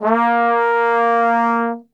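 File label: A#2 trombone glissando; Best Service; Brass Super Section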